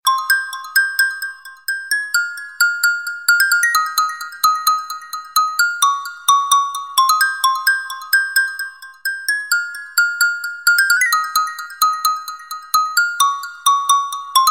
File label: Tribal Apachi